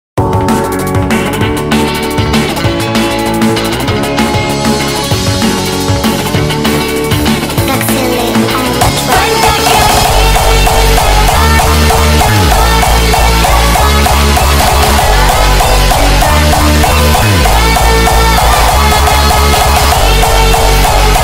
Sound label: hardstyle